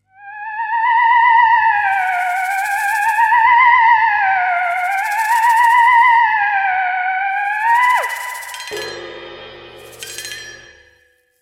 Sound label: theremin